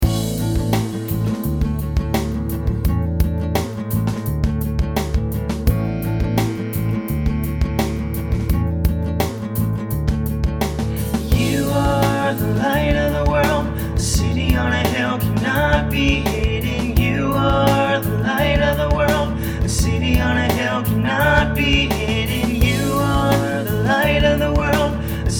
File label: with vocal; Matt 5 14-16; boys camp